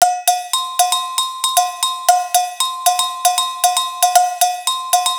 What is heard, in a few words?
Samba Limbo INTRO; SO Clips